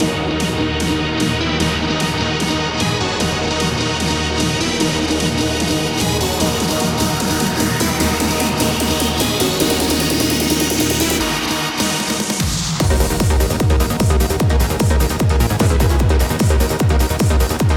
trance boss battle bpm150, Trance music for boss battle theme. This music is loopable.